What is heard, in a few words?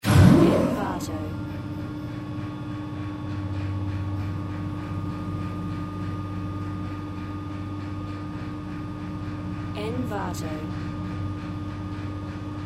elevator machinery